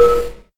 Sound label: counter; blockattack